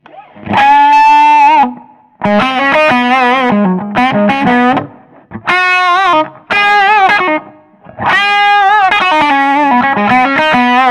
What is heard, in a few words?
ODS Clean; Vol 12; Bright up; Mid Boost on; Rock; Treble 13; Master 17.00